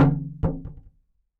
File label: CLOSET CL, Percs